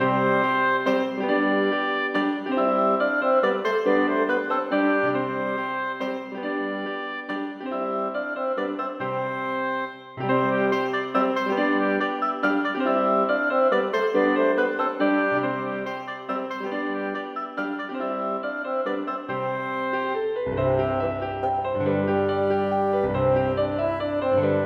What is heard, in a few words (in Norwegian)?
Menuet for obo, fagott og piano; Aamodt